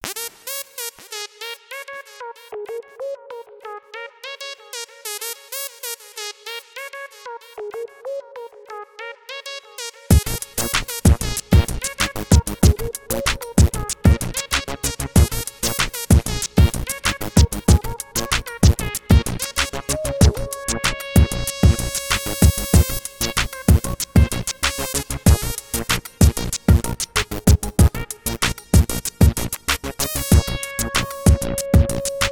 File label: groovin